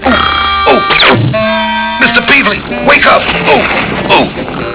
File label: oooo